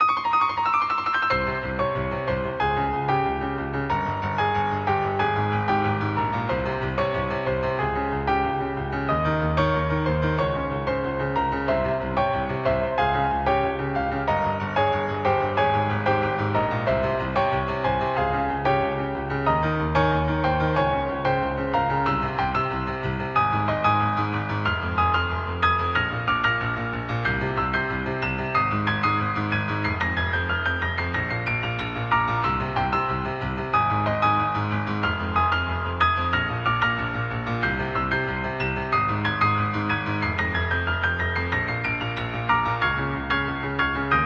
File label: boss battle piano